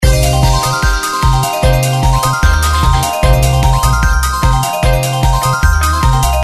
win

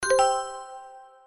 Special Message Notification

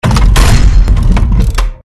close chest; emeralds bank

